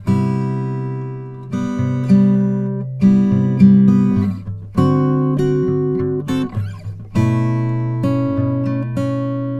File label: Xmass Guitar Loops 100bpm Gmaj; Guitars; Ghosthack; Advent Calendar 2019